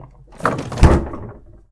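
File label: close fridge drawer, 冷蔵庫激閉/引出; 冷蔵庫激閉４/引出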